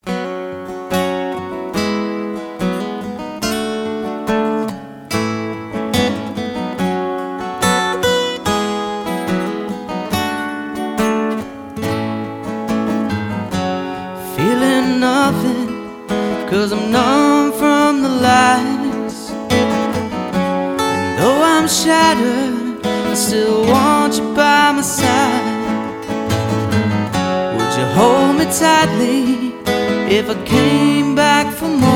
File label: Acoustic